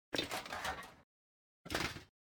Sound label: pick up and drop plate armor; baldursgate